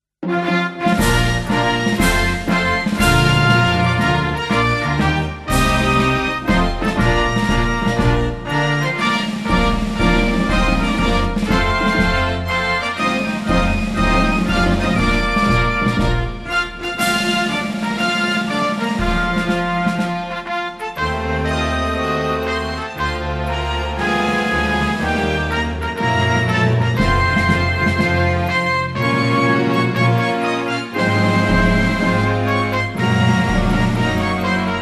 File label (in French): marseillaise karaoke; la revolution